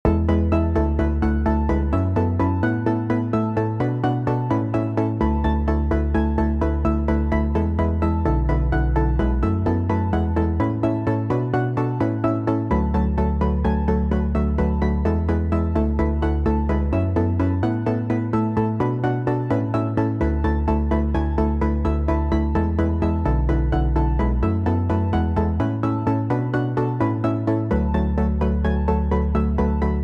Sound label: My poor synthst